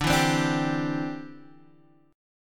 D 7th Suspended 2nd Suspended 4th D, Guitar Half Step Down; D7sus2sus4